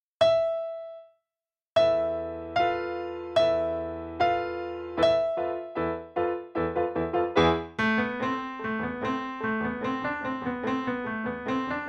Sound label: Melody